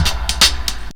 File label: DRUMLOOP, Ragga & Dub Reggae; AKAI; USB Soundscan vol.27; DRUMLOOPS